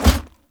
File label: Heavy Grunt Boot Walk Gravel, Footsteps; Characters; Enemy; Sinecure